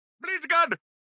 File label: town human road; Blind Drive